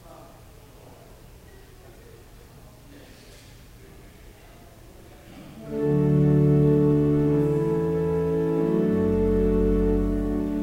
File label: Organ Music; True Blessedness